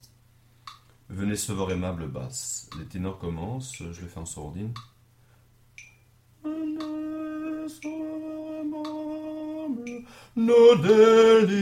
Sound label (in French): basse; Venez sauveur aimable